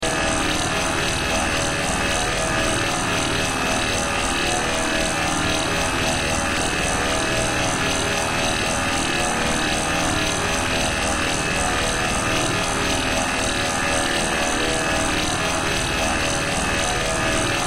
Sci Fi Force Field, Sci Fi Force Field Energy Source Constant Loop